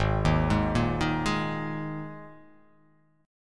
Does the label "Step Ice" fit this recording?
No